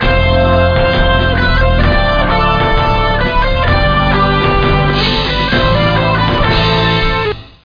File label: music; The Official NFL Yearbook 1995 to 1996